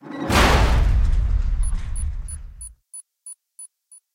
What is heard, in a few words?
training door close